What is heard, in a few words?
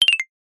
multimedia ui notification alert short glassy